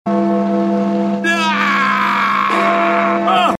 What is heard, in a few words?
scream and bell